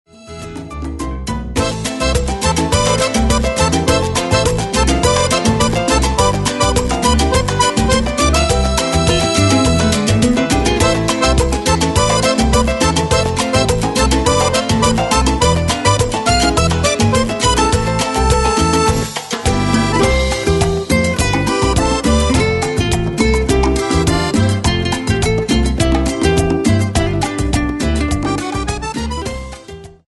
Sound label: Acordeon de Cuba